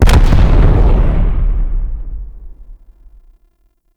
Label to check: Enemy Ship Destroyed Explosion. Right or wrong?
Right